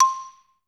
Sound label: XY LONG C5, Advanced Orchestra; Best Service; Peter Siedlaczek's Advanced Orchestra